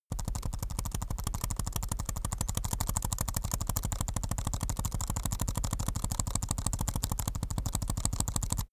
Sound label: keyboard laptop backspace sequence fast soft; Computer